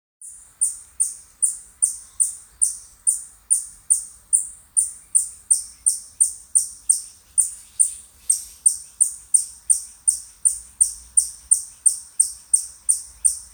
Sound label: Siberian chipmunk Eutamias sibiricus; Tilburg